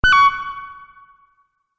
Multimedia Event Notification, beep sound effect; Andrew Fleming

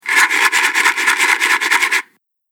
Shaking A Box Of Matches; Foley; Andrew Fleming

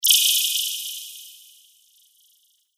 Wizard Novice Spell; Magic Sound Effects

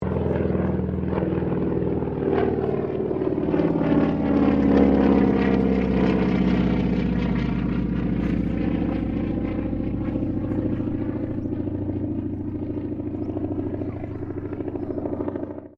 AIRCRAFT AT-6D HARVARD MK III PASS

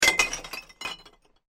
Bottle Plink, Glass; Glass Sound Effects; Glass (Sound Effects:Materials)

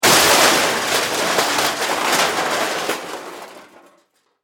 Impact Crash Metal; Materials

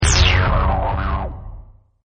BIG STEP, Button Sound Effects; Multimedia

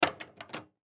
Latch Lock Box, Latch Lock Box Click Open; Latches; Foley; Objects; Blastwave

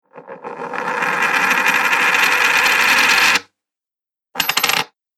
Coins Two Sounds, money sound effects

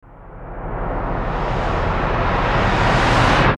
CRESCENDO RAMP, Crescendo Ramp Windy; Production Element Imaging Element Accent Transition

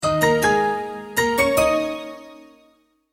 multimedia logo, Musical Identity/Signature

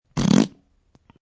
Fart; Farting sound; Human

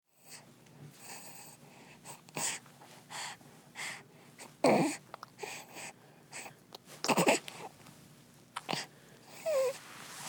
Human Baby Breathing; Babies; Voice

